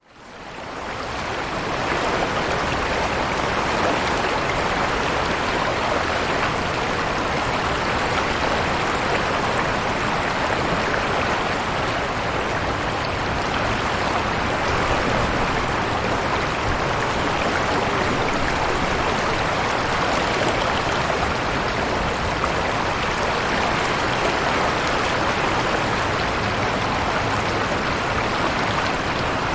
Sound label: Merced water sound, Yosemite; A language of the Merced River; Spring trip to Yosemite Valley